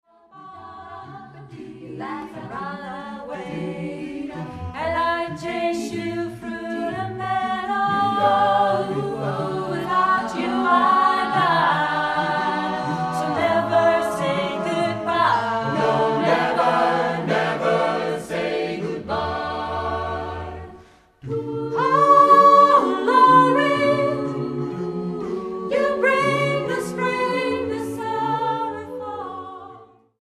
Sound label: ssatb